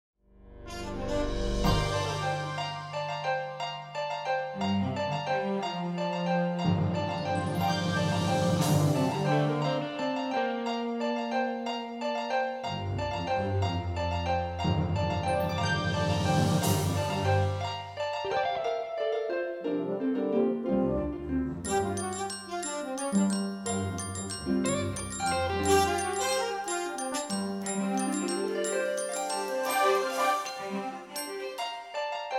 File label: Bells